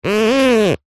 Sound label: industrial duct tape rub finger squeak